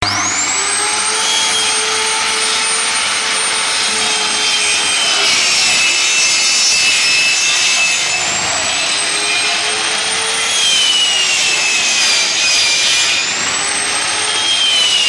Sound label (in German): Kreissäge